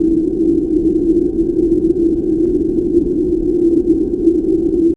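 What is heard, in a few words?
cave ambience; SFX; PC Gamer IT CD 38; thief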